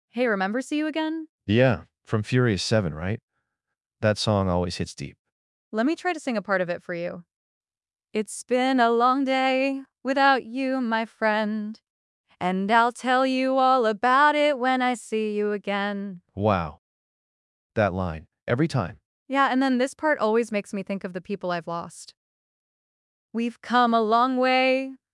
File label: Spontaneous Singing; Microsoft VibeVoice; ai podcast model